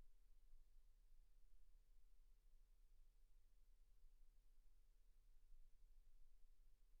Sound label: silence 48kHz